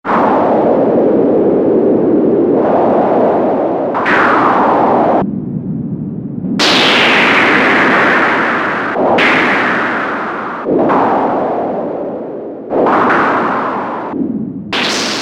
THUNDER, Oberheim OB-8; Factory Patches

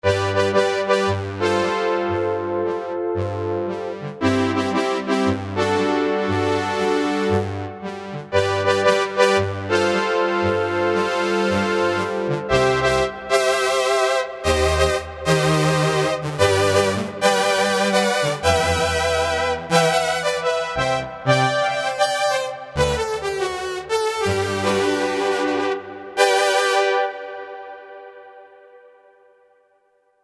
Synth Brass, Kurzweil K2000; Kurzweil K2000 Value Pack; Extra Programs; BRASS1X

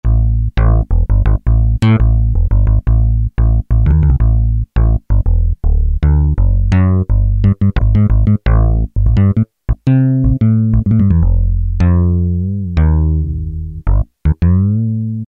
PICK BASS, Casio VZ-10M; Internal factory combi

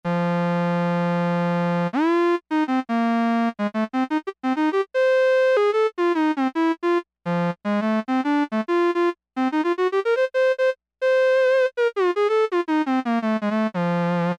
clarinet, Korg M-500 micro-preset

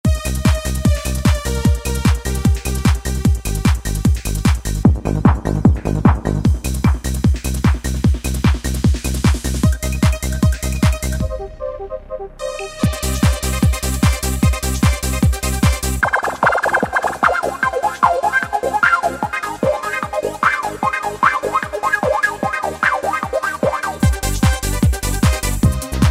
Trance Traxx, Yamaha DJX-IIB

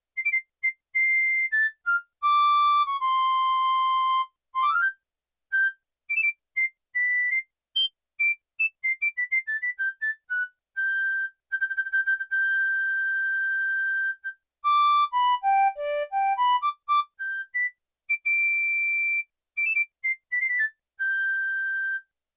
Piccolo, Yamaha TX16W

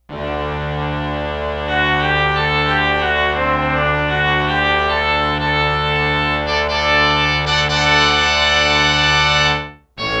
pipe organ brass

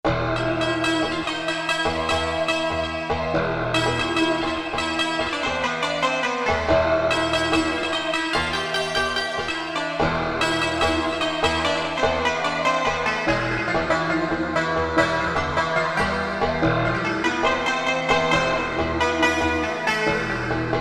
ARABIAN, Fretted Insts; Fretted Insts 1

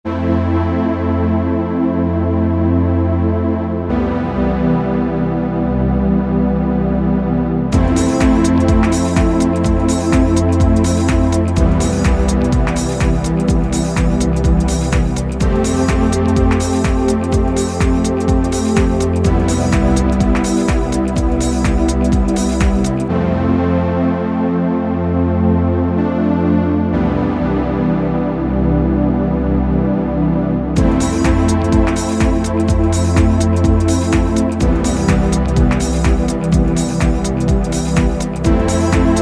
PAD; SuperWave P8

